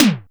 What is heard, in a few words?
Tom, Percussion; Vengeance Samples